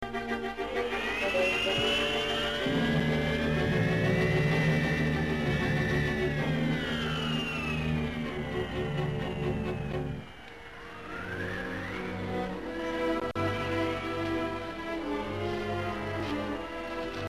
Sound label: Erotic Theme